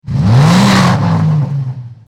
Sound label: car engine rev